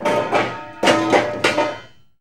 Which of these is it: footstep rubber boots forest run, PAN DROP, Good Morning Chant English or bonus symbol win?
PAN DROP